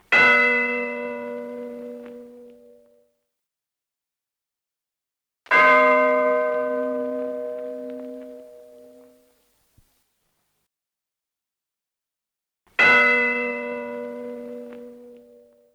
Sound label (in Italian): Campane; Inquisitor 3